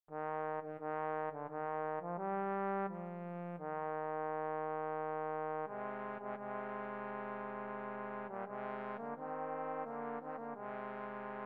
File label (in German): chor